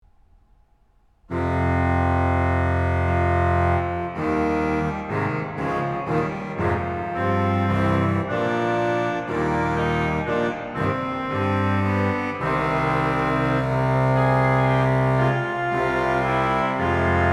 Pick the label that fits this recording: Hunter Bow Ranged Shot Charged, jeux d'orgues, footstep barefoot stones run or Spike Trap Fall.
jeux d'orgues